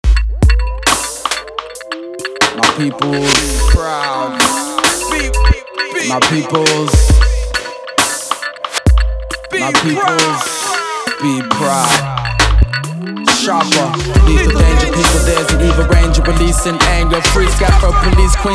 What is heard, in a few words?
sharpa dub